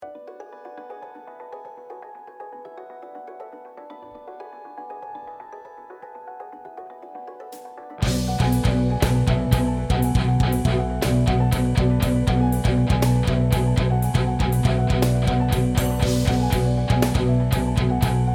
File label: Neck Breck Rhythm